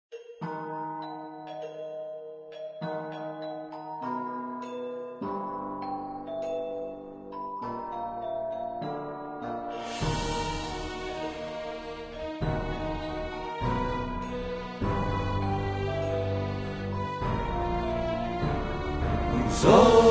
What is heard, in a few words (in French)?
hymne mordavie; reflets d acide